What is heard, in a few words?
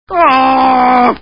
groan; barney; simpsons